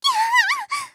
きゃああああ！; ハイグレボイス集

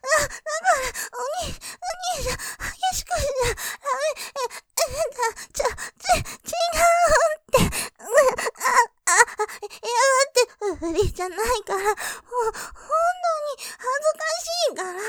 喘ぎ ピストン喘ぎで激しく; らめぇ; モノラル; ループで楽しむ！シェフの気まぐれ抜き抜きコース

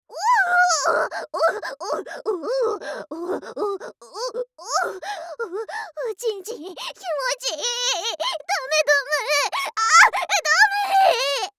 おほぉっ！♥おっ！♥おっ！♥おっ！♥

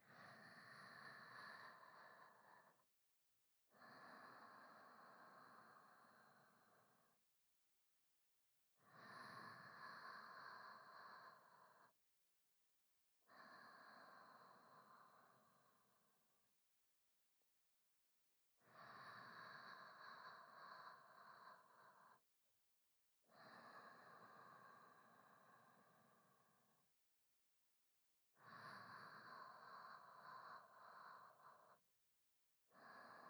寝息のみ

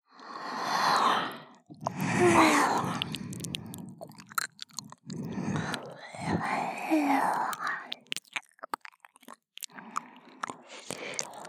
耳舐め 右耳 優しく ぺろぺろ; カスタマイズトラック; フリー耳舐め（右耳パーツ）

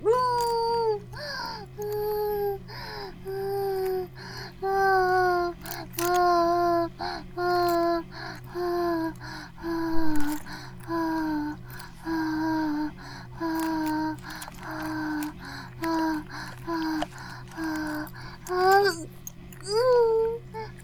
バイブ放置

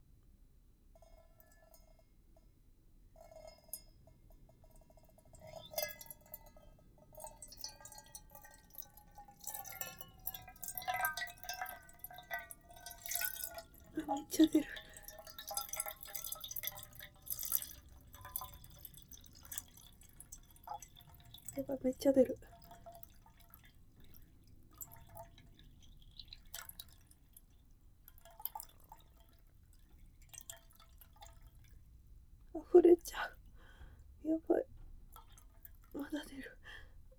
おしっこ 室内 ステレオ

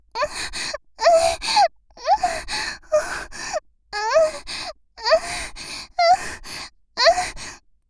アドリブ喘ぎ 短め

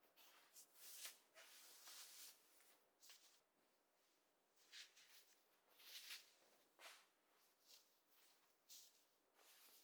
シャツを脱ぐ, ASMR; 動作系

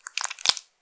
膣内射精前, 引き抜かれた瞬間; ■挿入or引き抜く

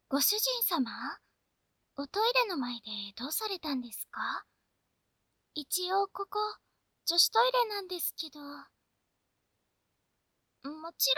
おしっこ; 千曜のおしっこ音声; ハーレムオナサポ 4人のメイドがご主人様のオナニーをお手伝いするバイノーラル～文、千曜、名月、莉桜～

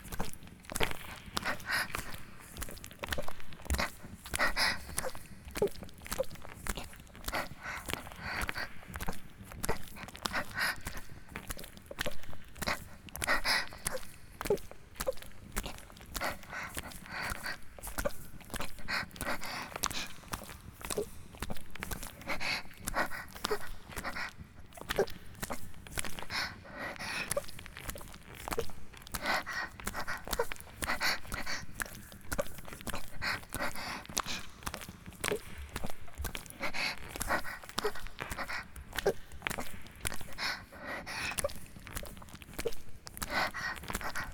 ギシアンループ; 田舎の別の無感情少女と自己犠牲愛2

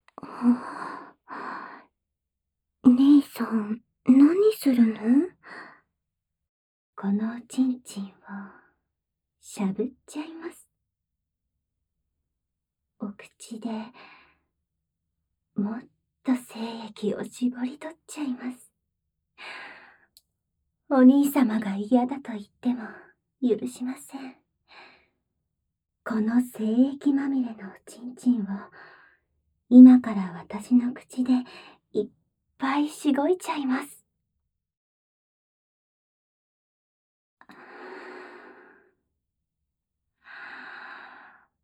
お耳イジメながら初「まぐわい」; ふたおにゃこ～親の居ぬ間の逆夜這いおままごと; バイノーラル

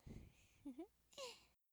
笑い声; ループボイス

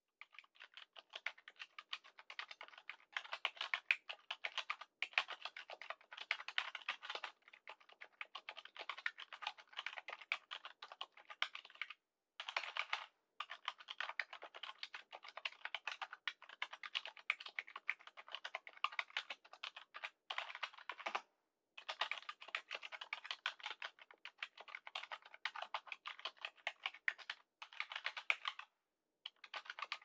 キーボード の タイプ音 メカニカル 茶軸

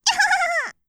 にゃはははは, 笑い声

